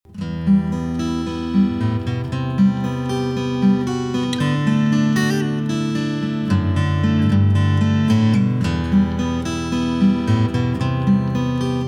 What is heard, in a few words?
akg p3s guitar test